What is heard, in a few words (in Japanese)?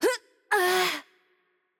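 Hurt Heavy, Japanese; ja JP; VO; bloom minigame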